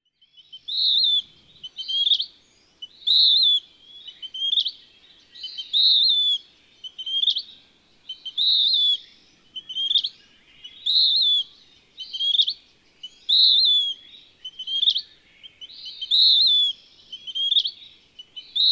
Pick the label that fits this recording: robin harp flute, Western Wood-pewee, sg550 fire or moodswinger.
Western Wood-pewee